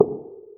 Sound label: Suspens Sound Effect